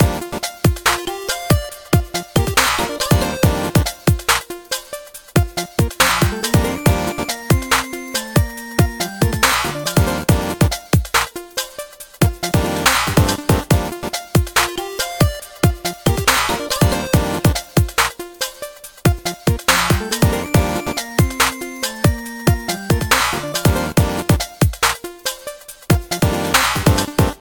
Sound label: BGM Victory; beat street lucky kat studios android